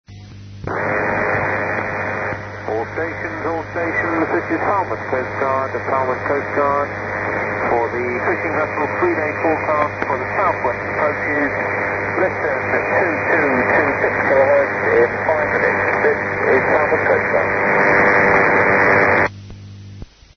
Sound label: 2182 khz, stations; falmouth cg; europe